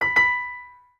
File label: whisper; Furcadia